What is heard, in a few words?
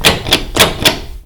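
door knob; doornob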